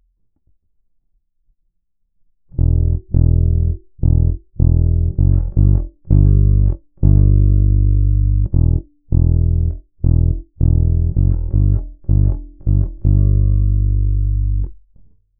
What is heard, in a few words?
bass prechorus